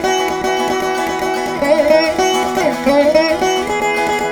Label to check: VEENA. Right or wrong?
Right